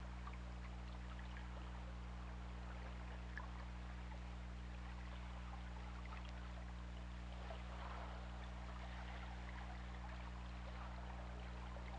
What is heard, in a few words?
Orca; OrcaLab